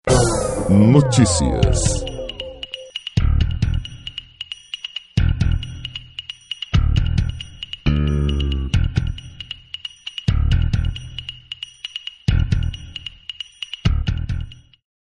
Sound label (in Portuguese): vinheta